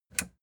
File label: plastic button latch push disable